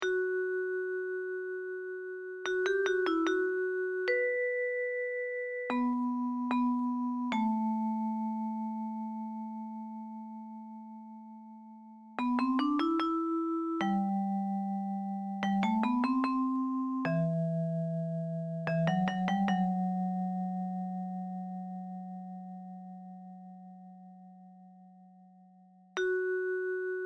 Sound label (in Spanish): estudios basicos melodia